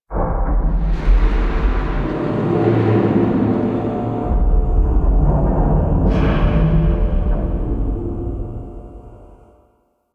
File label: Exterior Resonant Metal Echo, Ambience; Warframe; Railjack